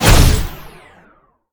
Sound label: Laser With Recovery, Sniper Rifle; quakeball